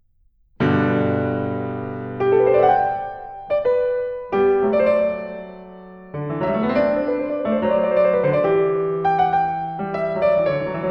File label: Variations, for keyboard